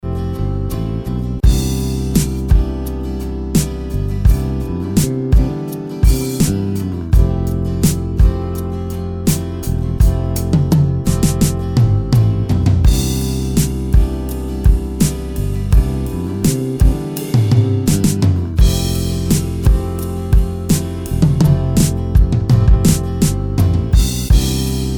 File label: boost 8bit